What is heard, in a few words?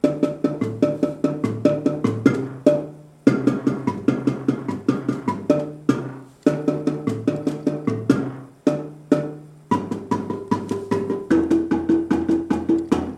bass c part, marimba